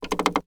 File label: Foley Wood Creak Floor